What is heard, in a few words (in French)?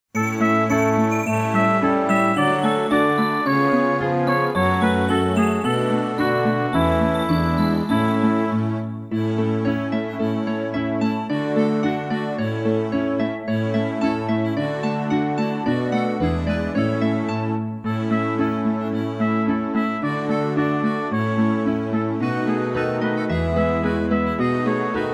musique